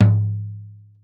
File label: floor